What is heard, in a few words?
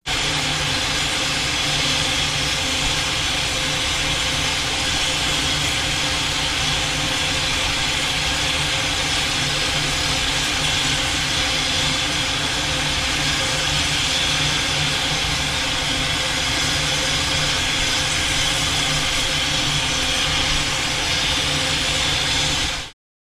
Steam Blow Down Inter, steam train